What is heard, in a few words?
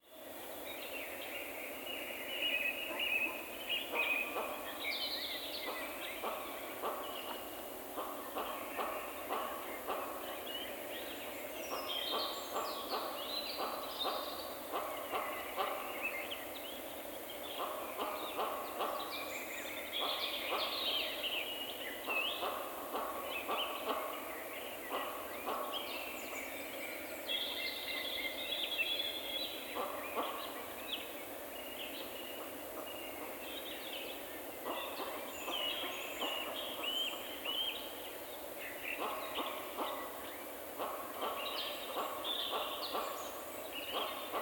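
zvuki lesa, forest sounds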